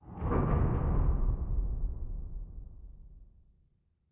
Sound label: empty ruin; forgottenruin